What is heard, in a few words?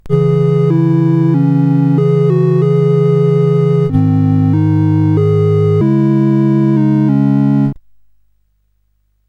CSG8580R5, GAMES; Woodpecker